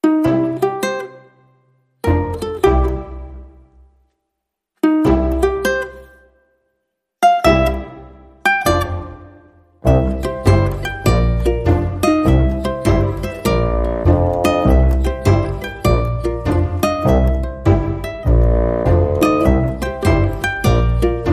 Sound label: No Perc